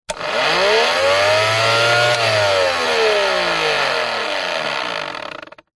industrial pump electric handheld on off short burst close to motor powerful rev